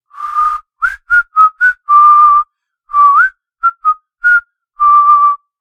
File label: Man whistling a little song